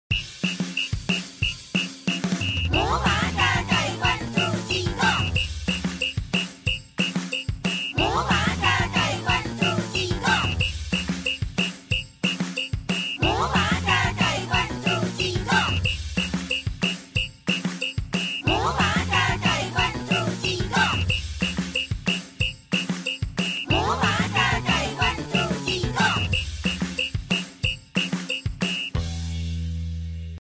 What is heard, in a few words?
background